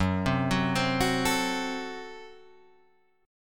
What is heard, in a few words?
F sharp Diminished Guitar Open G